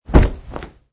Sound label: Martial arts - Clothes rustling, swooshing, fighting attack movement sound; Martial arts - Clothes rustling, swooshing, fighting attack movement sound - Variation 4; Combat Swings